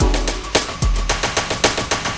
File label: 808 machino w fill; Sonic Foundry (Sony Creative Software); Ugly Remnants VOL-2